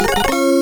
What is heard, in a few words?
jingles; superscramble